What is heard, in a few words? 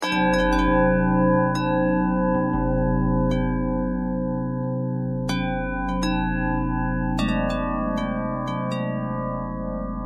bell harmonic twist, bells